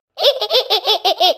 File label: hehe; tattletail